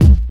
classic bassy kick a sharp key, Royality free kick drum sound tuned to the A# note